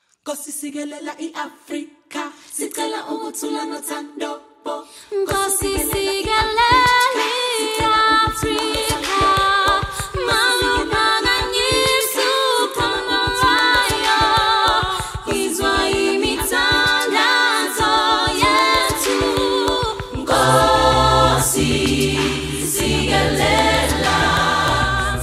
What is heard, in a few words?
Youth Choir, National Anthem